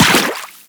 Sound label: run water, footsteps; Warframe; Teshin